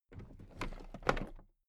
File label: toolbox raise regular